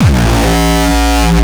kick; shock. Ultimate Kicks vol. 2

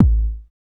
kick

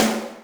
snaredrum, Yamaha RM 50

